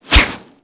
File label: Brawl Whoosh C; Warframe; Brawling